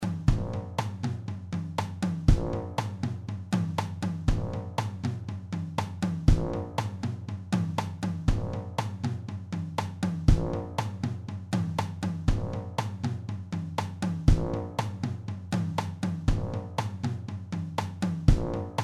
tuba 2 batt

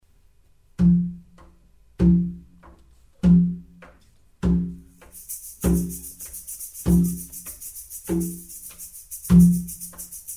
samba lele

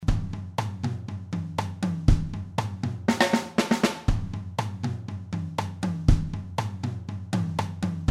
batt break suite

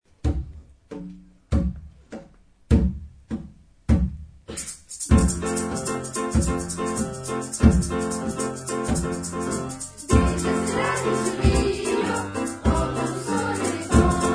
samba lele

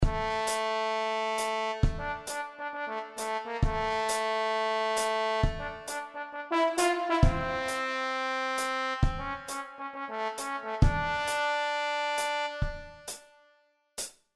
Trombone

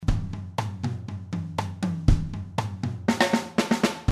batt break

